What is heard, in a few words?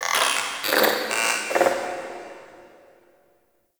Robotic Chatter; Guild; Warframe; Misc Remaster